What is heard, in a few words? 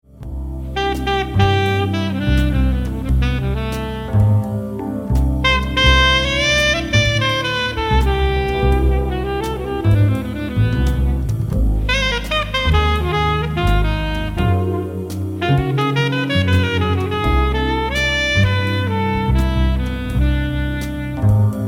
Song Alto; Marma Small